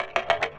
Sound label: contact perc